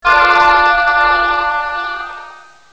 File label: bot fall; waycoolgames; escape